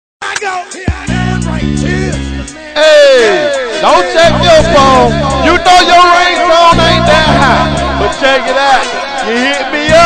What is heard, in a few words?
Mystical